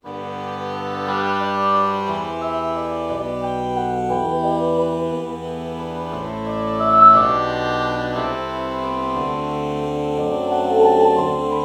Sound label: oh oboe morph